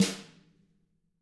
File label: HIT, Drums; CAMCO